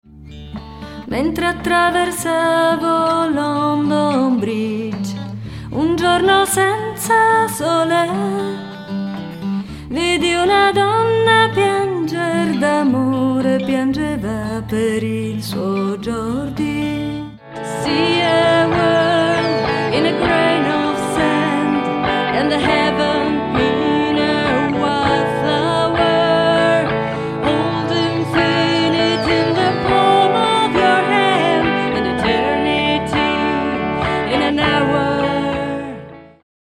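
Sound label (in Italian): Italian, Singing; voice demos; GoLocalise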